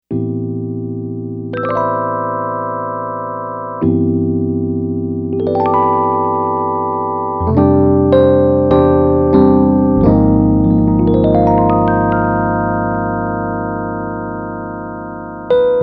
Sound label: rhodes